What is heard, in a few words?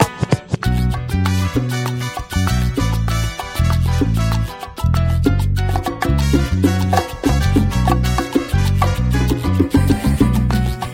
Latino; Samsung